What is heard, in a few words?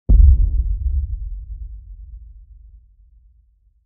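sounds of a high five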